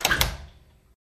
Door Close Latch; latches & bolts

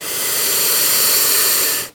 Lizard, Water Monitor Hiss. Close Perspective.

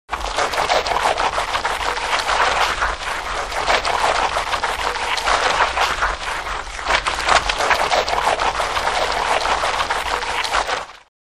Heavy Scrubbing, brush scrubs

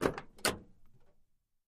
Camaro Door Open Thic, chevy camaro

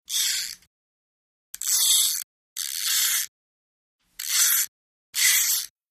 Fish Reel Cast Pull, fishing

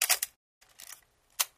Single Camera Clicks

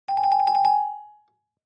Clavichord Trill; keyboards

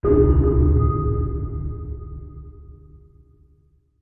Bass Impact Tonal So; impacts

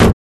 Metal Impact Hit Swe

